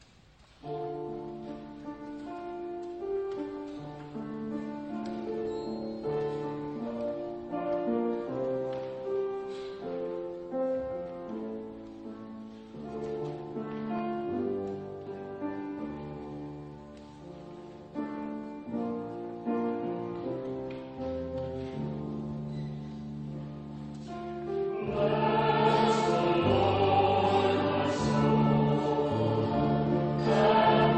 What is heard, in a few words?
choir